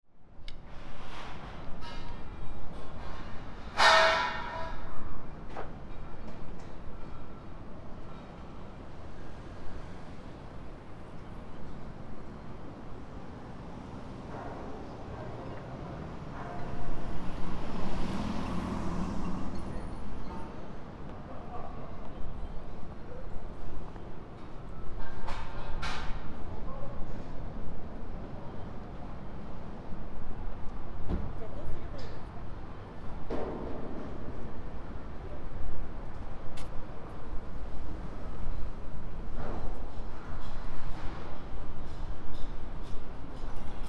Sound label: fukushima station off-street